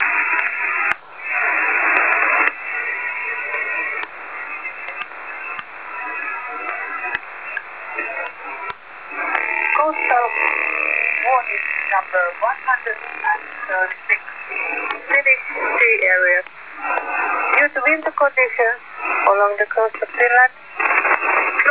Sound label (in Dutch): onbekend, Onbekende stations HF; 1.797.00 onbekend